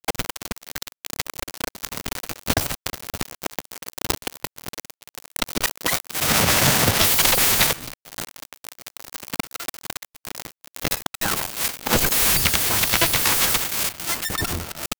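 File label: glitch interference white noise; experimental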